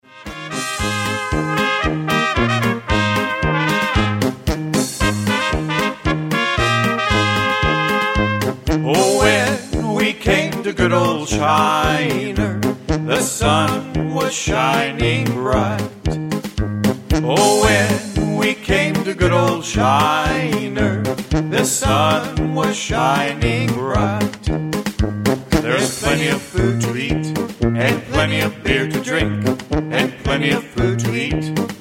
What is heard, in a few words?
polka